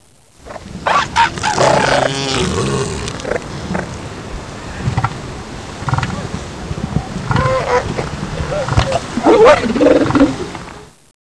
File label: seal harem